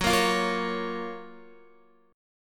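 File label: G flat Major 7th G flat Guitar; GbM7 strummed; JGuitar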